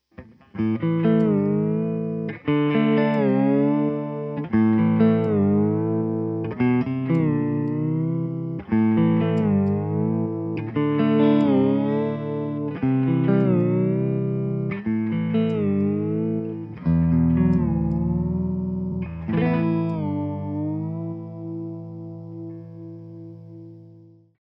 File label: Pedal steel kinda 2nd down